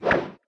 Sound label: Sword Slash